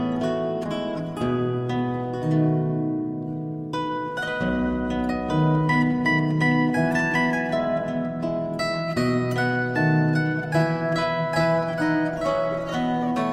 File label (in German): Menuett aus dem Passeiertal, zither